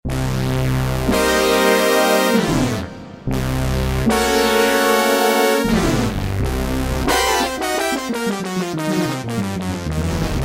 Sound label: Dyno Synbrass, Kurzweil K2000; Kurzweil K2000 V3